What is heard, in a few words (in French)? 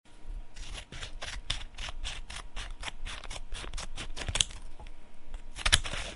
Ciseaux papier; bruitage; Electro-menager